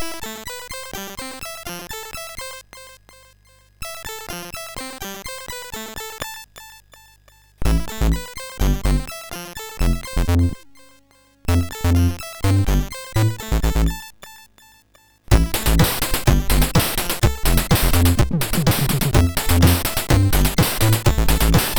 sid MOS6581R4; Peroxide

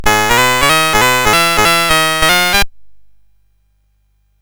sid CSG8580R5; GAMES; Metro-Cross

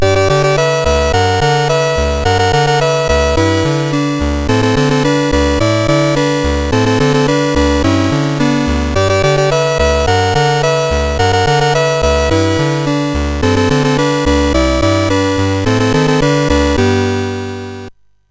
Rocket Ball, MOS6581R4

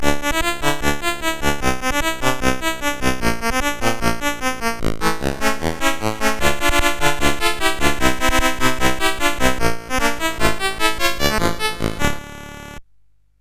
Rocket Ball, CSG8580R5; GAMES

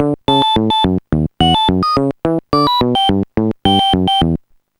jazz seven; Instant Music

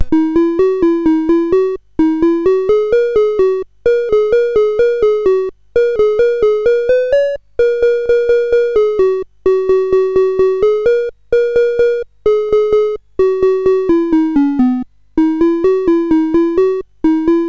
sid CSG8580R5; Orbitron

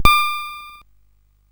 sid CSG8580R5; Magic Serpent

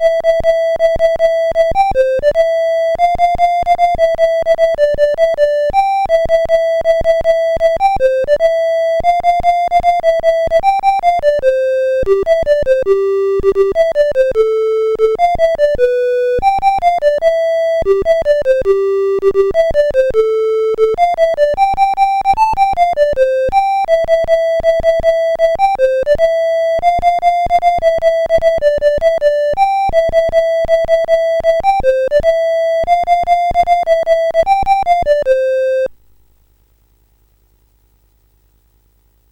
Jingle Bells, CSG8580R5

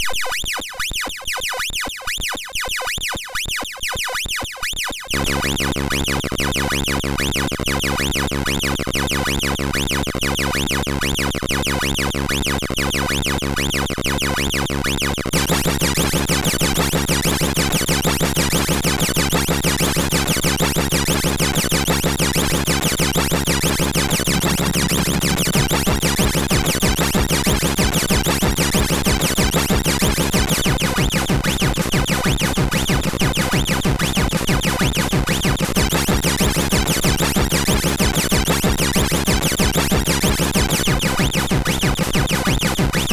intro, sid MOS6581R2

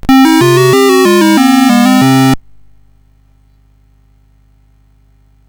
sid CSG8580R5; Frogger II-Three Deep

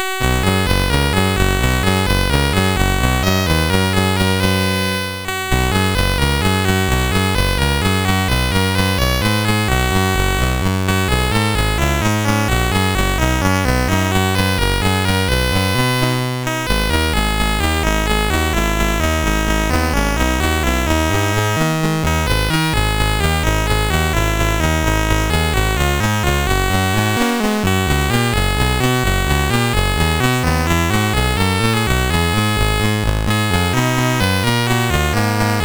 Digi Hits, MOS6581R4

